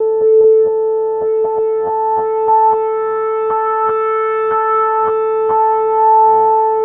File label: SNTH BASS TEKNO 140 A